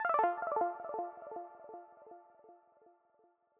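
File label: Arp Strike 160 BPM F Minor; FX; Demon; Cymatics; GHOST (Beta Pack)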